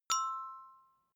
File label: notification glockenspiel third